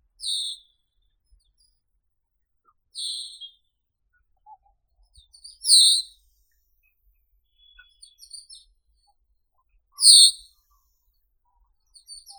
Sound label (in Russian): drozd lilovii myophonus caeruleus